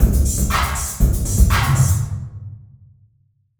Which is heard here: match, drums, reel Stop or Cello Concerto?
drums